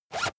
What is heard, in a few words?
zipper use fast